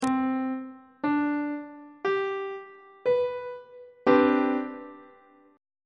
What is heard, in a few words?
d double flat major 7th suspended 2nd chord; treble clef